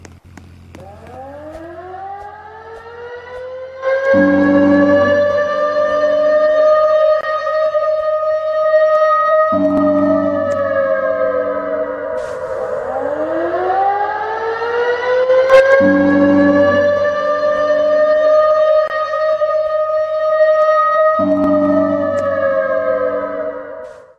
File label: air raid siren